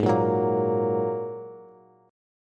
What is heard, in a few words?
G sharp 7th Suspended 2nd G sharp Bass; G#7sus2 strummed; JGuitar